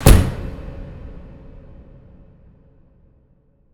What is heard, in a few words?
impact hatch; Hits